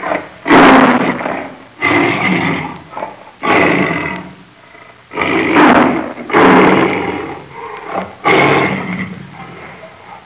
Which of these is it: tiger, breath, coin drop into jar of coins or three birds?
tiger